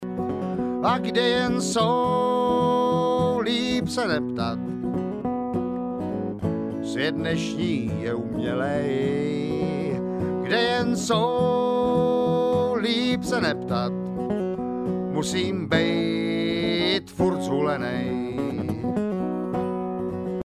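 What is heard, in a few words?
vocal Lead, bluegrass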